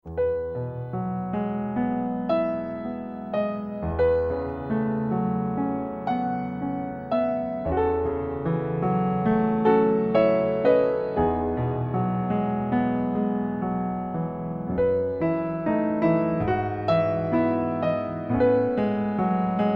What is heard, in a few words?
piano solo